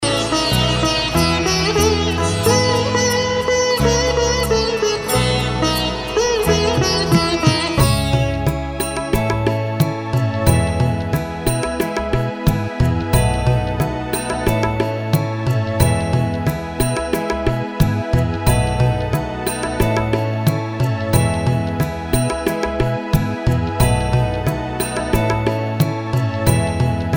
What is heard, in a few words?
Karaoke Song